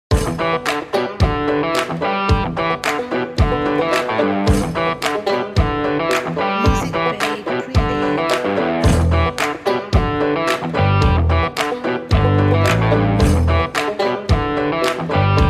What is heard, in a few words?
Country Rock